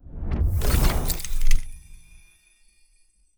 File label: ui level max